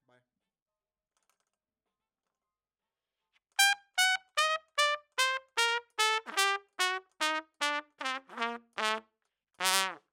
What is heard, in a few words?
Trump